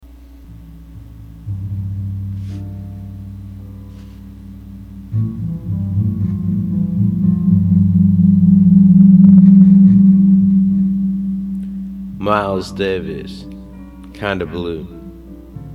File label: jazz